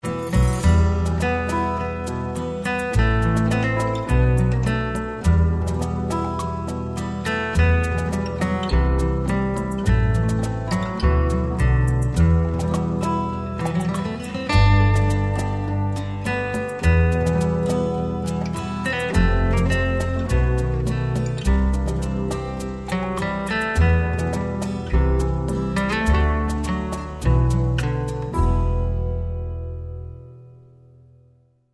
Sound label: o come all ye faithful